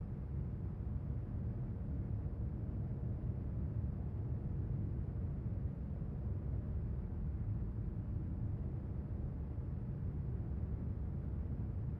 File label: ambience quiet